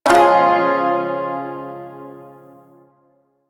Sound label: Shawzin Phrygian Chord F, Music; Chords